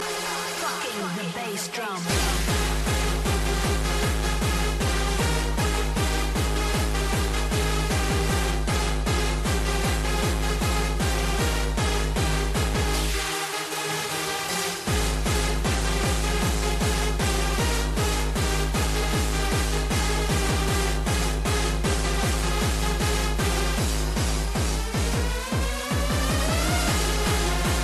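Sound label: bass drum ringtone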